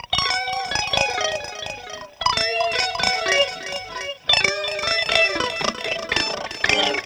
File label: GUITAR, Funky Ass Loops; East West